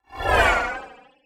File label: enemy enter